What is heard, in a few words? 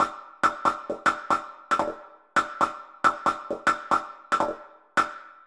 Hat 92bpm